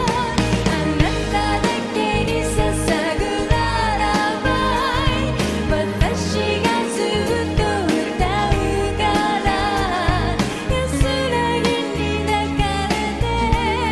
Sleep Song

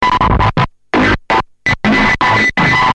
new electro, ringtones

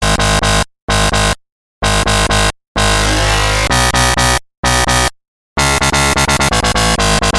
Reptile Bass

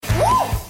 waxing pain

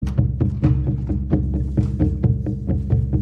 Drums; Jumanji

